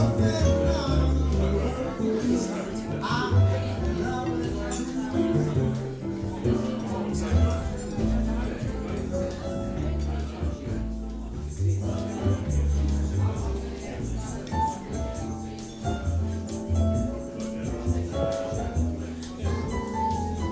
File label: jazzing it up